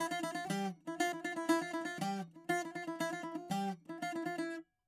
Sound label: bouzouki